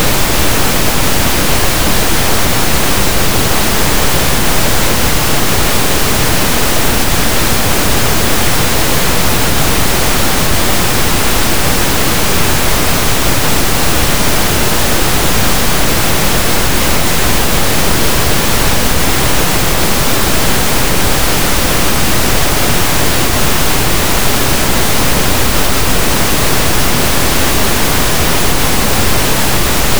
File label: Pink Noise